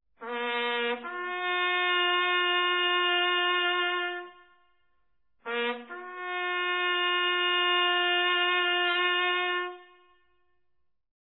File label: Lights Out, bugle calls